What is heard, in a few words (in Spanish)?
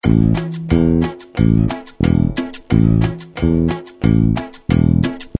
Patron bajo cumbia